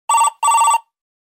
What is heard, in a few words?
Office Phone 2x